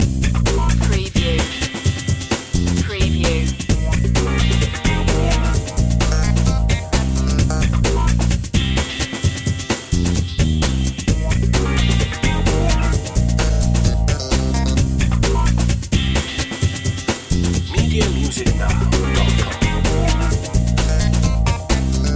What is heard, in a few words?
funky loop